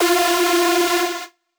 Lead - F

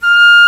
Flute mf F4; Digital Sound Factory Virtuoso

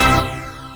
LOFI, Synthy Tonal; Distored Reality 1&2

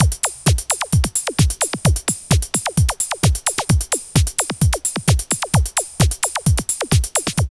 LOOP, Tekno Industrial; East West

